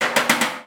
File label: Trash Can Hits